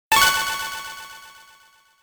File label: checkpoint; ritz